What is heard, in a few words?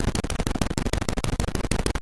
stampede; Dino Run